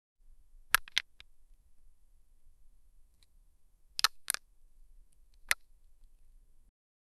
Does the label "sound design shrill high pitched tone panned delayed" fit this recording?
No